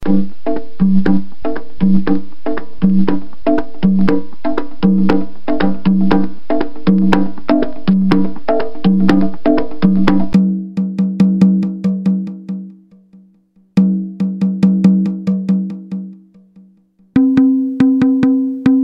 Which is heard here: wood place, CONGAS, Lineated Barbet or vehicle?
CONGAS